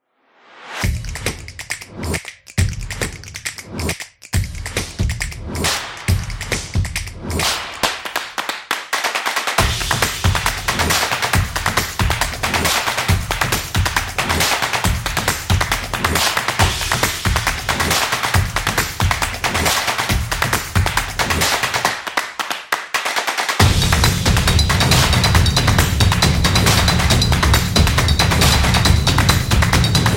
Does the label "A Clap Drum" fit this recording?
Yes